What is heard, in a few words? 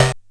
Bent Perc